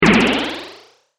Generic Bonus Or Pickup, video game

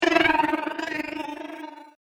Angry Android Ants, robot; Robot Sound Effects; science fiction sound effect; Andrew Fleming; Twistedtracks

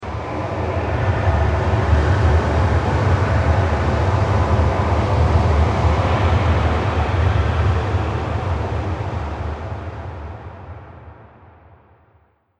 The Underground Realm, scary soundscape

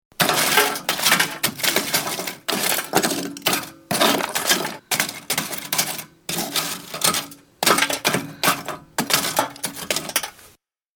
Raking Ashes, Raking out the cinders from a fire.; Fireplaces; Household

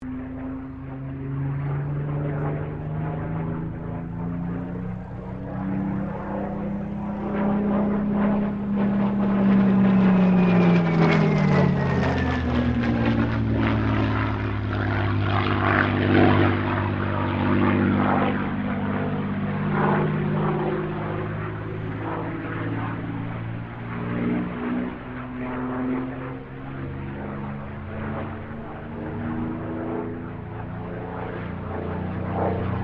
AIRCRAFT SUPERMARINE SPITFIRE MK-XVI LOOP; Airplane Sound Effects; Vehicles

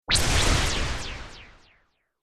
Laser Swish Delay, whoosh sound effect

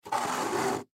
Chair Plastic Slide; Chairs; Foley

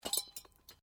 Metal Light Movement Minor

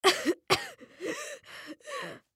Human Cough; Cough Wheeze Element Girl; Human Cough Wheeze Element Female Young Girl; Sick; Sound Effects:Human

